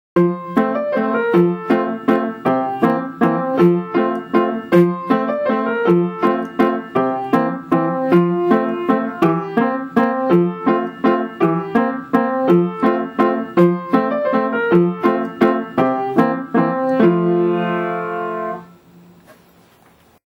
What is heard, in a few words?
piano